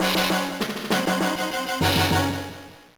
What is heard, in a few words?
Jingle; rebelstar tactical command 2005 gba